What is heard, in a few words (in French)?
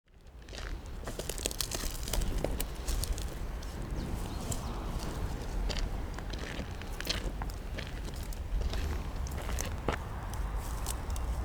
PAYSAGE SO NORD DOUAI; Operation Chemins propres